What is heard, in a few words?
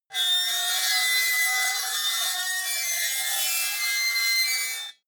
metal lid screech